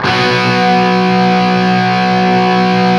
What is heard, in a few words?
5TH B looped, Guitar; East West; Steve Stevens Guitar Samples Collection